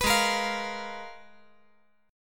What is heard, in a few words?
G sharp Minor 6th Add 9th; G#m6add9 strummed; 16,20,18,20; JGuitar